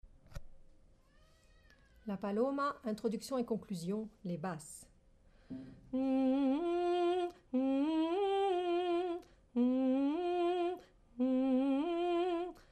paloma Basse

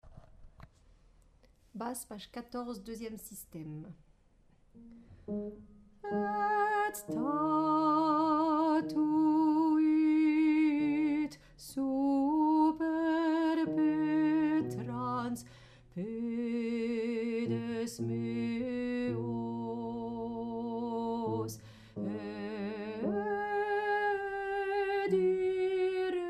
psaume Basse

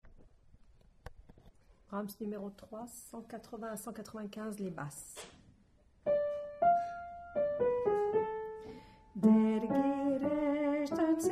Basse

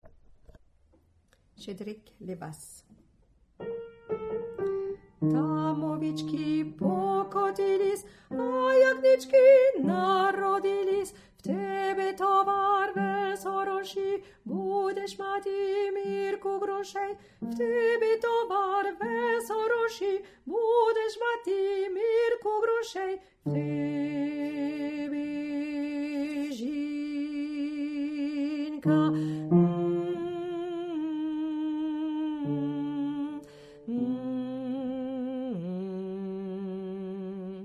Basse

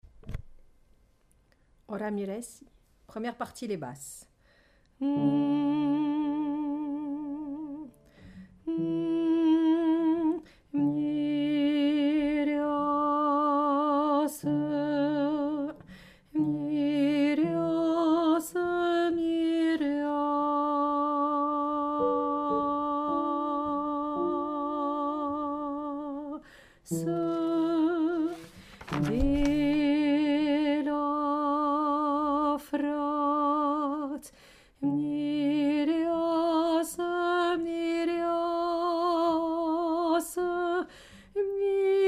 Basse

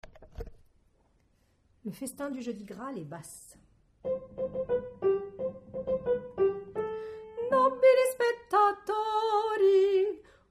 Hommes

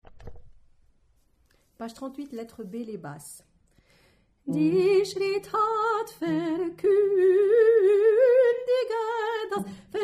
Basse

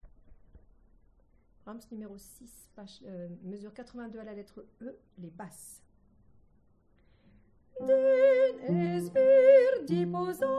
Basse

